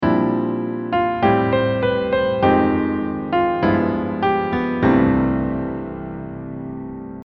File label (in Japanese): スケールで考えるメロディー